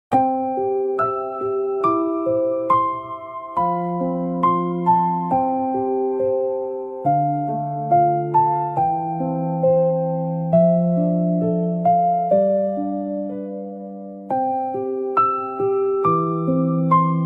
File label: bedtime lullaby for babies relaxing sleep song; snappy silk label